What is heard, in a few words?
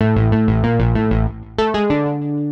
Chase Bass 95-A, horrorscape samples 95bpm